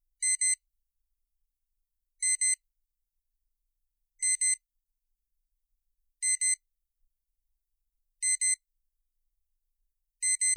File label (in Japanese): mezamashi